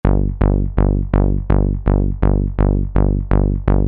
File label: Bass